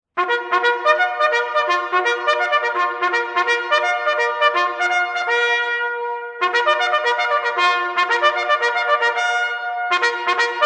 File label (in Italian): Sveglia; La giornata dell'Alpino 2003